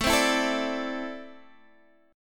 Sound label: A-Minor Add 11th A; strummed; JGuitar